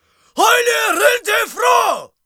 Battle Cry, Viking Vocal, Un-Processed; Battle Cries & Phrases; Ghosthack; SkyRealm; Viking Vocal Builder

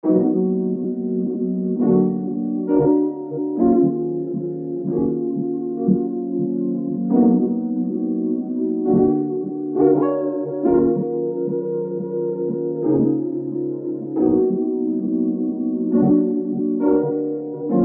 68 BPM C# Min Pad; Cymatics; Serenity

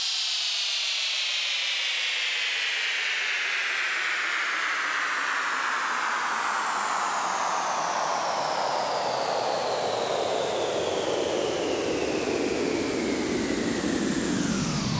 Tension Noise Down - 128 BPM, White Noise; FX; House

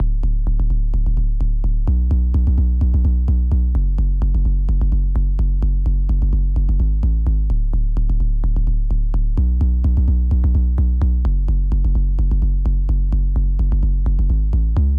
128 BPM F# Min Sub; House; Drop Loops; Cymatics; Mothership

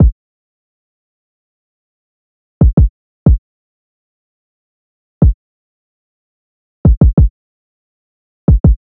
Livid Drum Loop - 92 BPM Kick; Loop Stems; Cymatics; Vibes II Drum Loop Collection

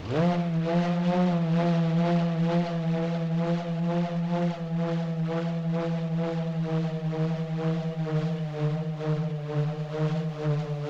LoFi Motor Whir Down; Vintage Cartoon; Foley

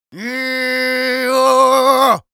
Yells, Viking Vocal

